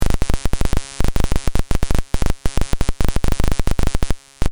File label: noises